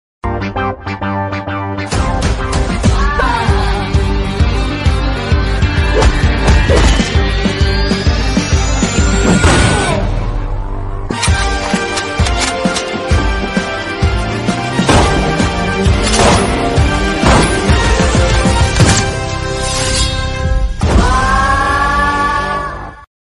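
background music telugu movies background music ringtones; raja vikramarka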